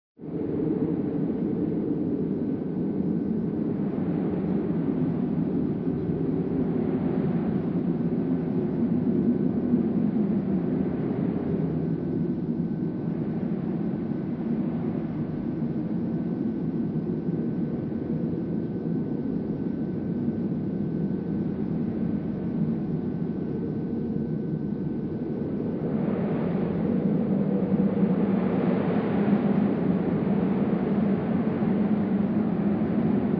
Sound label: Ocean Storm; SOUNDDOGS